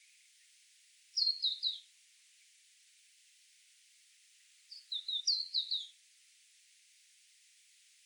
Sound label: Auriparus flaviceps; Ecología del Paisaje Sonoro